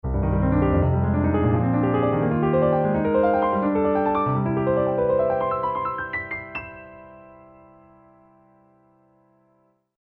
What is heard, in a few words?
On Mic Premier; patch demo; 16-bit, 44.1 kHz stereo; xp-80; roland; roland demo cd-3